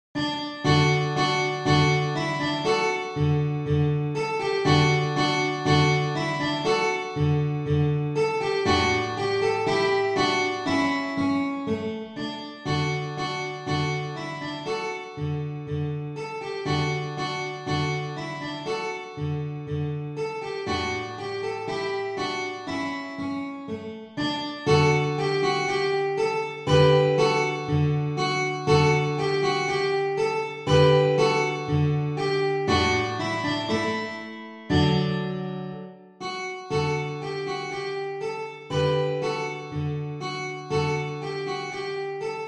guitar

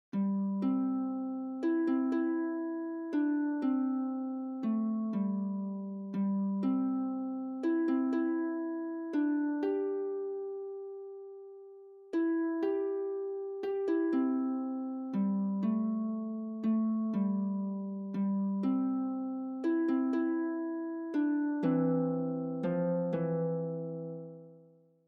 harp

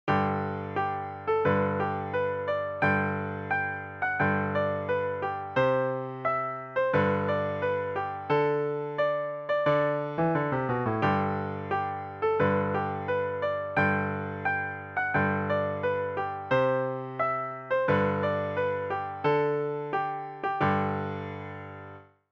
scotland the brave piano

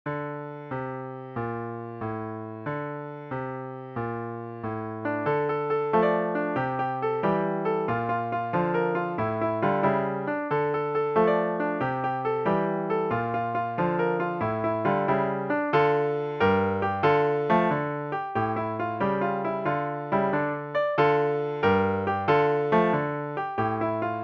easy piano; a pirate i am

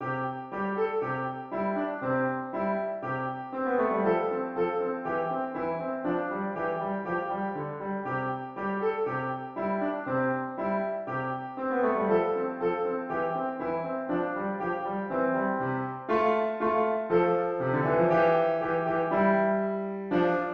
up on the housetop piano

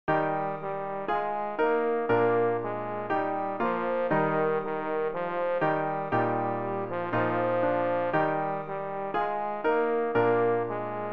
trombone

